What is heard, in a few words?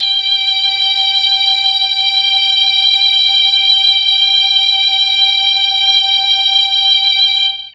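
G3 strings brass, mellotron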